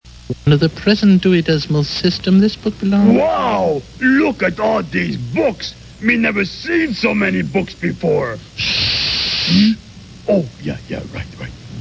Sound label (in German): shh, In den USA ist der Laut Shhh offenbar der bevorzugte Ausruf von BibliothekarInnen